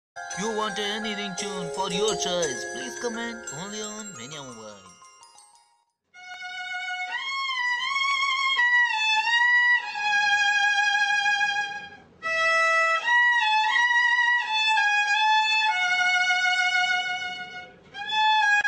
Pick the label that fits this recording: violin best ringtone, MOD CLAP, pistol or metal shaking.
violin best ringtone